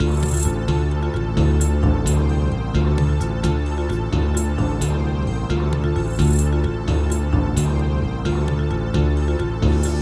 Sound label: Yamaha SHS-500, wavetable; Heretic